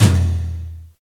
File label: acoustic tom drum single hit e key; tom drum samples